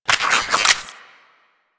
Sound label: Pistol Reload; Sentient; Crp Sent Amalg Pistol